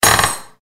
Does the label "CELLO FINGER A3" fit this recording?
No